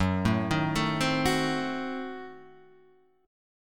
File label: Gb-Diminished 7th-Gb, Gbdim7 chord; arpeggiated; JGuitar